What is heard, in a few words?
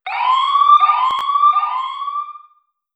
Alarm